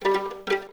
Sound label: VS G 3 Pizz, String Ensembles, Violins; Miroslav Vitous; 11 Violins